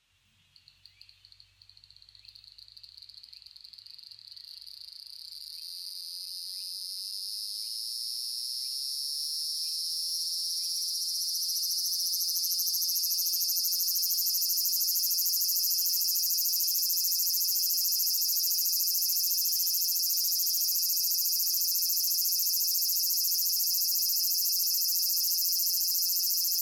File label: Graptopsaltria nigrofuscata; アブラゼミ; セミ科; 日光市小倉山 alt=600m; Rec.: MARANTZ PMD670; Mic.: audio-technica AT825; 他の自然音： ツクツクボウシ; Kokekokko -Nikko Today